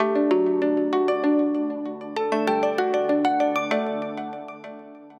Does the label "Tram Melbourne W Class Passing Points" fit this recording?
No